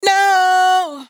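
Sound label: riff no E minor, Vocals, Dry Vocals; Raw Vocals; Slate Digital Omnivox Vocal Sample Pack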